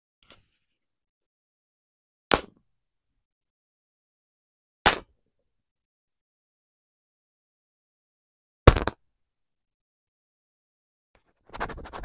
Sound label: Pen drop move